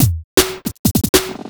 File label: Naked Beat, Drum N Bass; Sonic Foundry (Sony Creative Software); Knutrix Drum & Bass